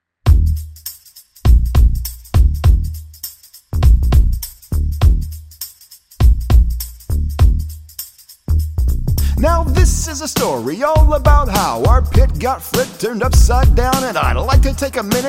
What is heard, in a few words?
Rap Song; Singing